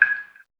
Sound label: electric light blink; The Swapper